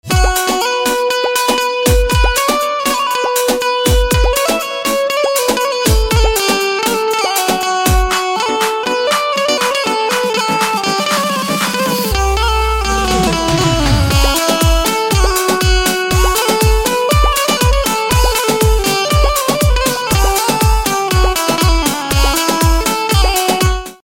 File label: Banjo Instrumental Ringtone